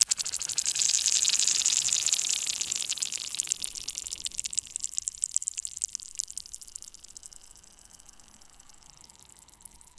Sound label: a surreal melting sound, deformation